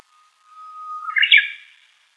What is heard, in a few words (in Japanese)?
uguisu; zukan